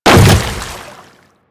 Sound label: Hammer Boss Step Water, footsteps